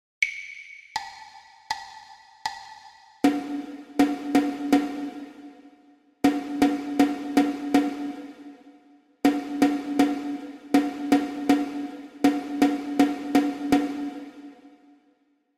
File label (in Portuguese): percepcao ditado ritmico